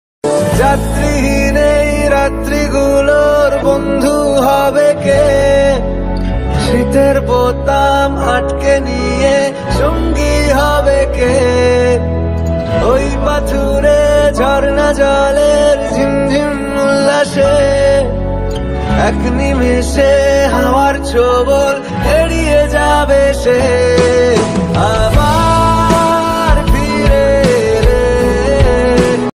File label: bengali ringtone